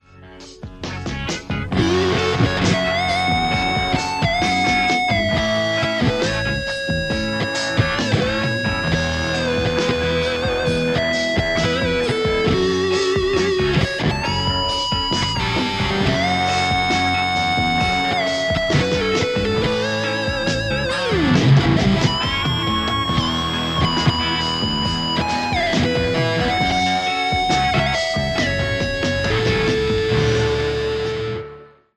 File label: Les Paul; igniteamps emissary v1 00